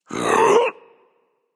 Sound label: breath, voicepack; smite; jorm